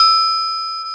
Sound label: SYN, analog